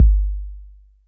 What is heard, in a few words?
kick, Heartbeats